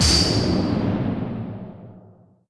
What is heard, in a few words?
Btl Hitlrng Darkbullet; The Denpa Men; Android